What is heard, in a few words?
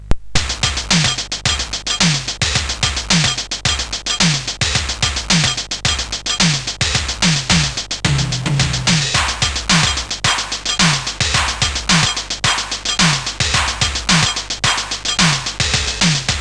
Digi Drums, sid CSG8580R5; hvsc